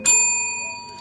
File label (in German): Klingel bei Pausenverkauf